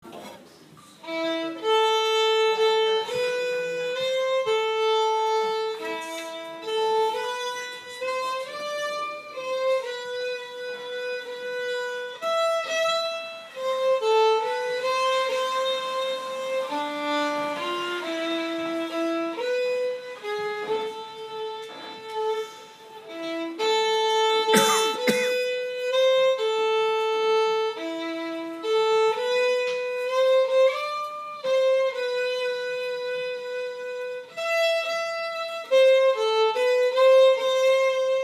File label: Finnish; Wild Rose